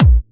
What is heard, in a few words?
Kick